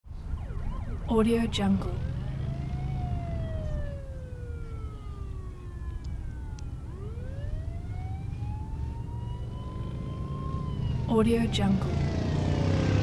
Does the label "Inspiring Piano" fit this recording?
No